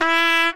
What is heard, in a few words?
Mariachi Trumpet; WrestleQuest